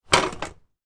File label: industrial sack barrow movement set down; zapsplat